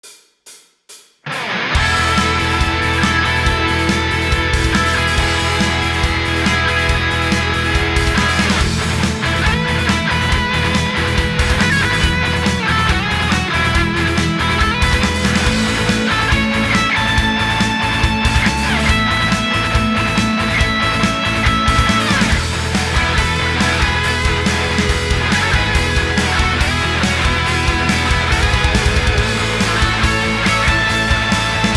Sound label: Rock Cover; Mario Kart 64